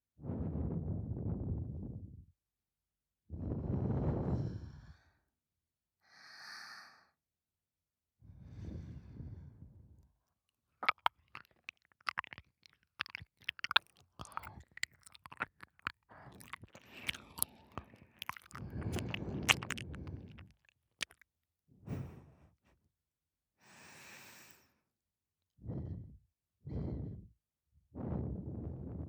耳舐め ふーふー; 後日談5

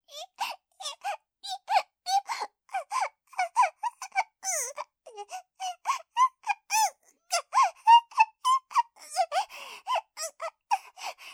喘ぎボイス; 02お狐様喘ぎボイス

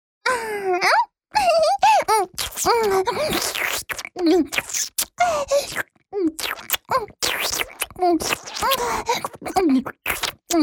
フェラチオ(中):陰茎を機嫌良くしゃぶる

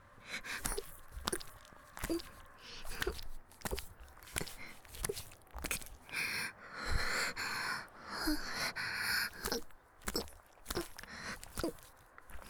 木枯らしバージョン ギシアンループ; 田舎の別の無感情少女と自己犠牲愛; おまけ (第4、5話のギシアンループバージョン)

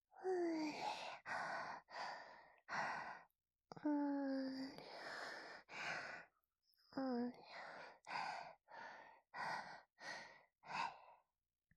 首 スリスリ ループ用

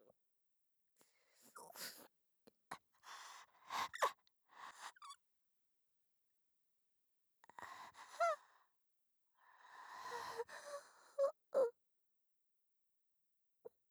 頸動脈締め; 効果音あり

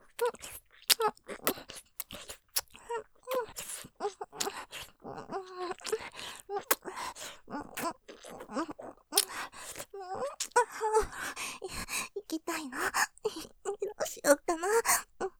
フェラ音マシマシ さらに舐め音多めに ねっとりと; おかずにスパイス２; 勢いマシマシ; モノラル

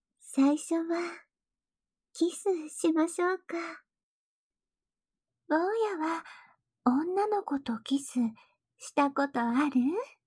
キスと耳舐め; 効果音なし

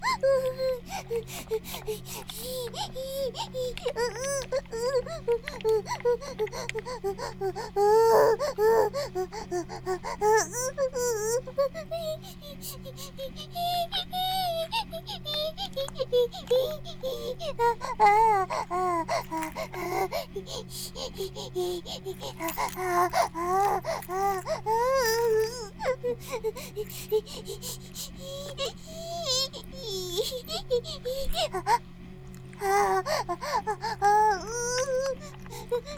バイブ放置, ASMR

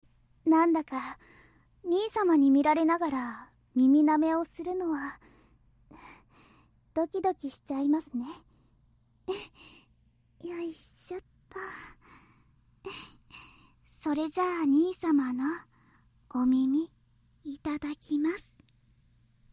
耳舐め 左耳

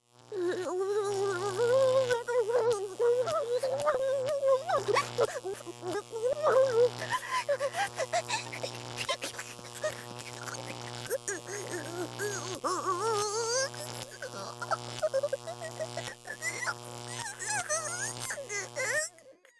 神経麻痺

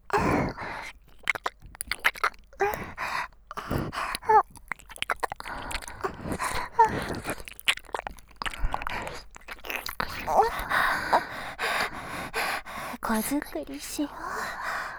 耳舐め 喘ぎ; シャルロッテ

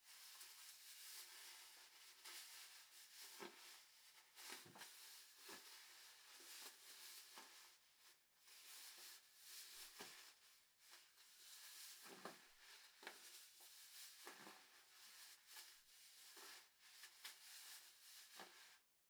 まさぐる 衣擦れ; 3DIO; アダルトな音

